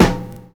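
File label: BROKEN SNARE; Univers Sons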